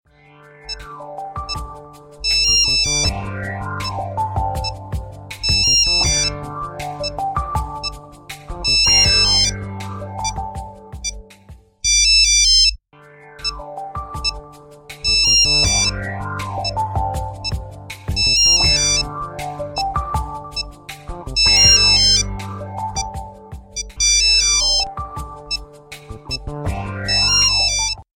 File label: Perfect Lumia Ring, ringtones